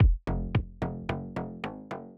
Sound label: Kick And Bass Before Chorus, pop rap